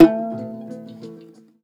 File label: SHUR NOTE, TOPSHUR NT